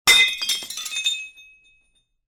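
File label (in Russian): на пол тарелку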